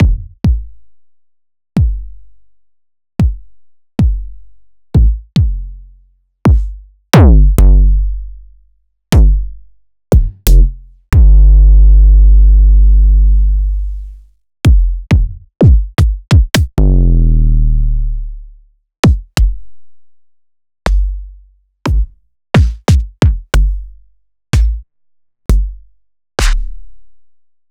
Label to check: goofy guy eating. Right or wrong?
Wrong